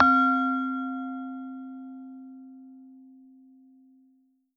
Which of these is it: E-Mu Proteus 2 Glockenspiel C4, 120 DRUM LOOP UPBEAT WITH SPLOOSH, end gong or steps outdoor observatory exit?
E-Mu Proteus 2 Glockenspiel C4